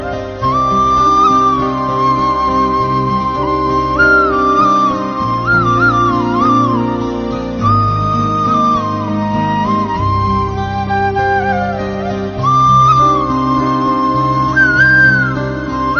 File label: Soft Tune; Murder 2